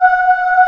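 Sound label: AHH VOICE; B CHOIR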